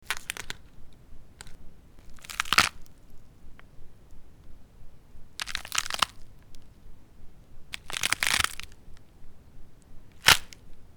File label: bone fire ice breaking crackling crushing splintering